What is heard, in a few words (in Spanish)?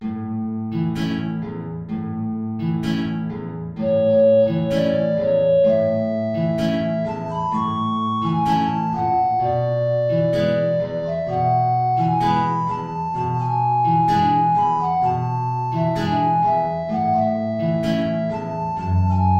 tango